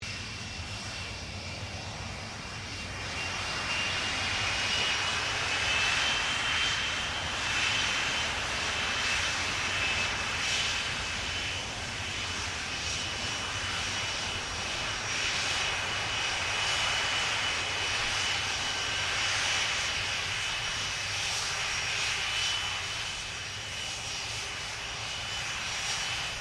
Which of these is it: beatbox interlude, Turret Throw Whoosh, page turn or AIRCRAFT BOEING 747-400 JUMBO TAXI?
AIRCRAFT BOEING 747-400 JUMBO TAXI